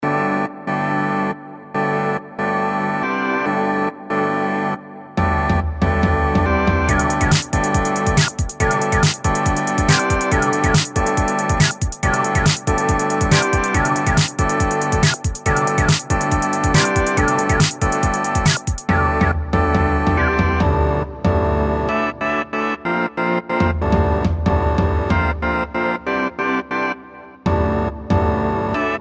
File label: Jungle; Schism